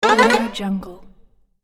spinning game jump

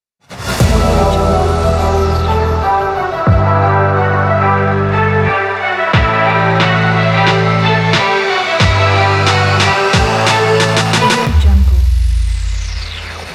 action sport trailer kit

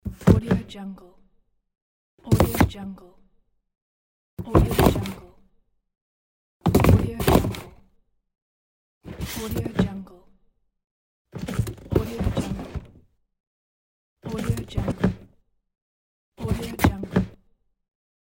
cardboard boxes

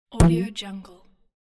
funny cartoon

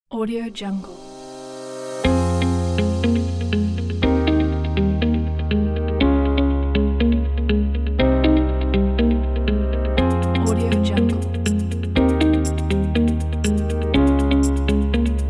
the background inspiring

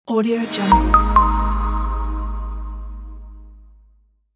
marketing logo

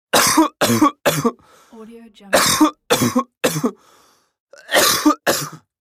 man coughing

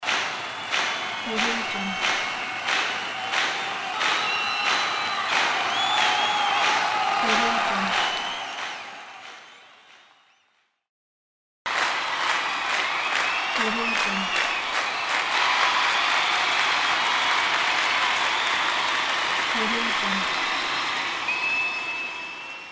crowd cheering and clapping whistles

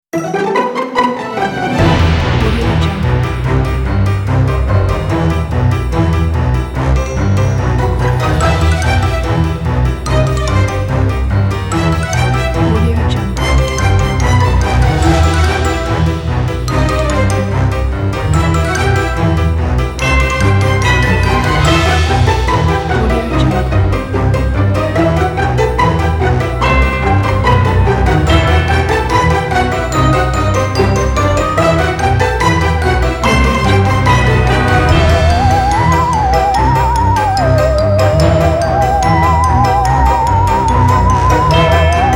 halloween fun instrumental

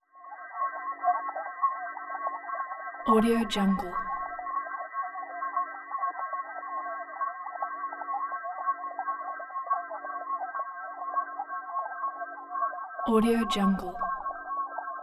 dark scifi drone

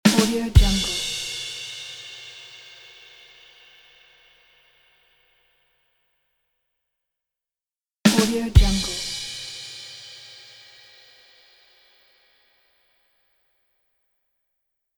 badum tss; sviridpavlov

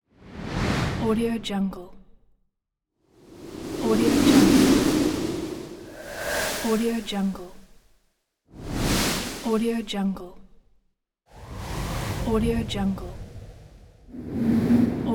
whoosh wind

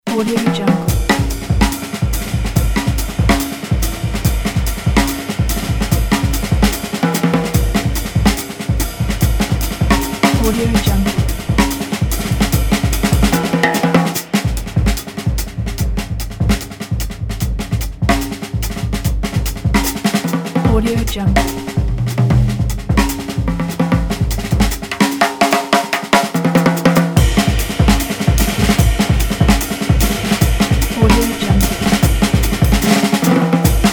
tasty jazz drums fast; birdman

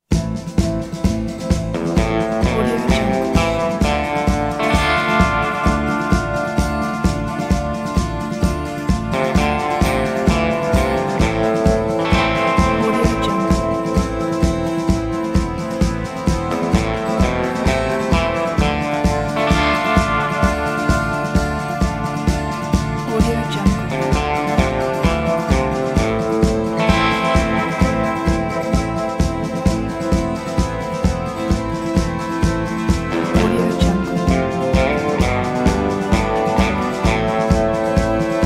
spaghetti western

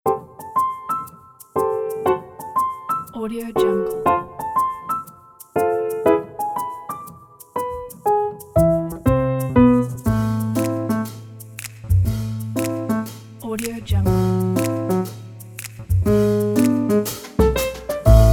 scam jazz piano

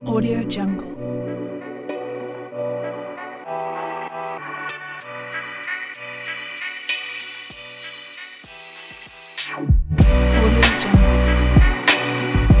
gangsta rap urban hiphop

